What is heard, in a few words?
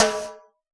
latin kit timbali